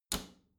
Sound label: bathroom cabinet close regular; objekte; tueren